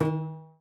Prepared Piano

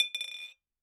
Bottle Struck

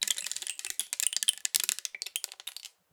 Rain Stick, Percussion Samples

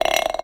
Bolt Glass Scrape, Medium Wine Glass

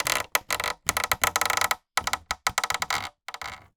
Polystyrene Creak

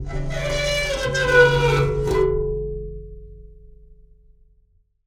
Pane Of Glass Rub

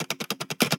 Camera Sequence

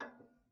Ping, Kitchen Toaster

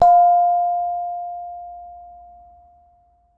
Bonang, Gamelan

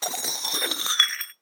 Hole Saw Scrape; Hole Saw Bits

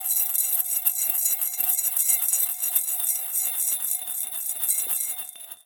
Spin In Glass, Medium Wine Glass

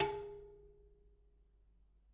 Bicycle Spoke

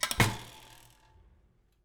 Spoke Spoke Pedal, Bicycle

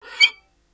Violin Extended Technique

